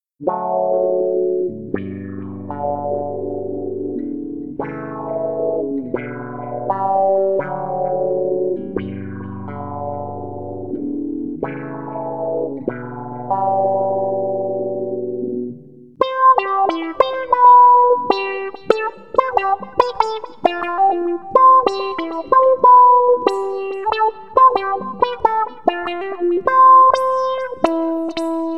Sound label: envelope filter